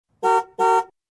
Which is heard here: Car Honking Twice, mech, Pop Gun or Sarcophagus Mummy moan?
Car Honking Twice